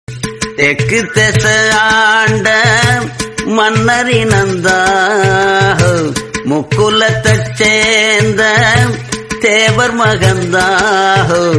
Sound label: Sad Tamil Song; Ringtone; Thevar Magan; Devar Magan